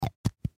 Test Tube Close, Test Tube Close Lid; Hospital Sound Effects; Surgeon Surgery; Emergency

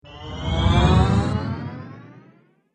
Space Jump Whoosh, whoosh sound effects; sound design

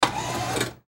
Fast Food Window, Fast Food Drivethru Window Open; Fast Food Restaurant Drive Thru Window Open; Industry; Restaurants; Blastwave

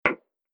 Wooden Whack Sound; Wood Sound Effects; Impact

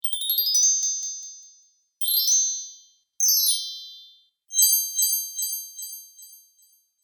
Magic Fairy Wand Wave Collection

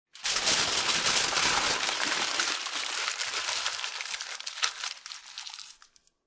Foley, Aluminum Foil Being Crumbled; Crunch; Crunches

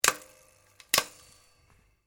RIFLE REMMINGTON700 7.62MM BIPOD COLLAPSE; A selection of domesttic firearms; Rifles; Guns

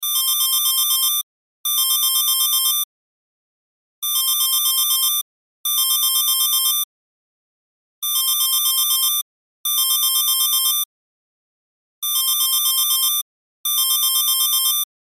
Cell Ringtone; Loop; Cell Phones; Electronics

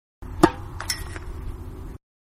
Tennis Serve into net, Hardcourt; Sports; Manhattan Production Music

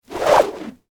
Warfare Whip Movement Slow; Whipping; Combat

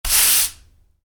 Sweep hard brush; Household; Miscellaneous Household

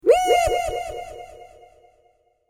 Created Creature Small Ping, Alien Sound Effects; Created creatures, vocalisations and pretend speech

